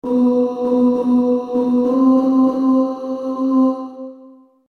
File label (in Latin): veni sancte spiritus sop; Veni Sanctus Spiritus; pingst